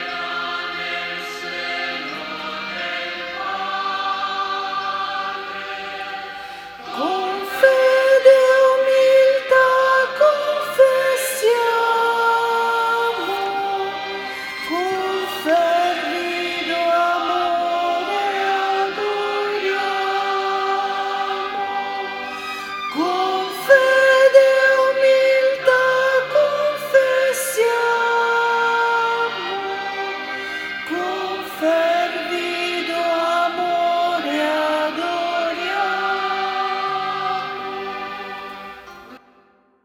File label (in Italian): Soprani